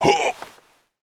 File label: temwarrior damgup; monster